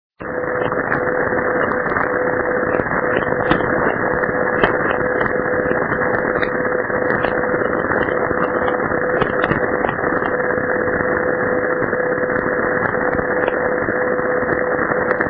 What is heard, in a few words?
Time signal 77.5 khz